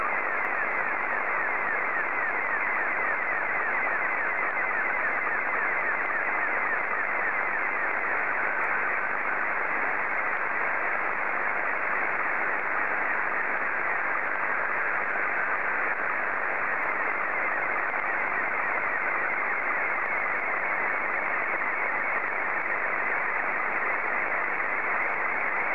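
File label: SSTV, Scottie 2; JA3OEN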